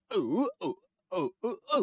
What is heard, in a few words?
Man Dance Happy; Zomboid; Lifestyle